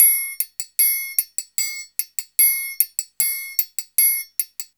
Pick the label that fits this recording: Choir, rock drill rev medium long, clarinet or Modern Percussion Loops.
Modern Percussion Loops